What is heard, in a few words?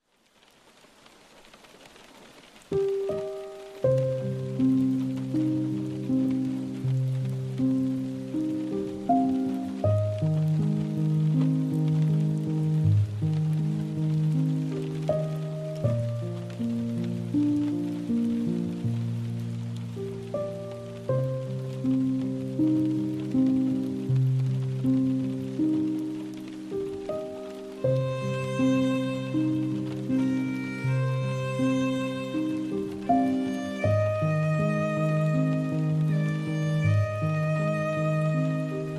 Rainy Mood